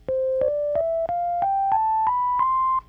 Nord Lead 4 sine

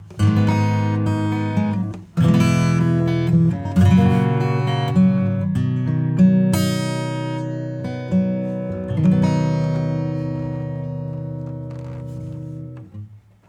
Ac Strumming

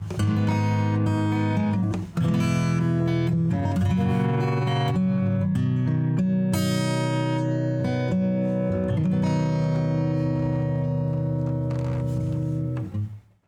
Ac. Guitar Big Sustain; Autoformer

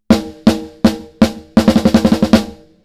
ROLL OFF Early 80Hz; Seventh Heaven Professional

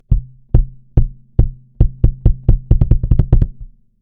FET compressor kick bypass

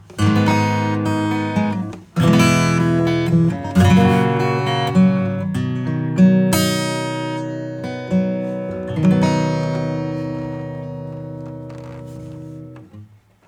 acoustic Rock

